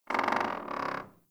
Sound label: Vendor Wood Floor Creak; Ambience; Soul Plane